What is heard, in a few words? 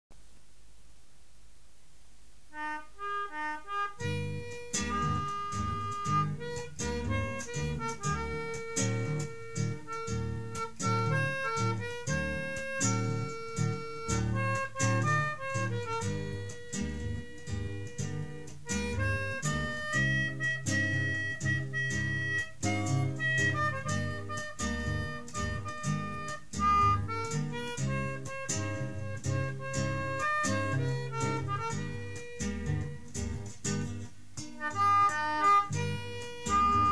instrumental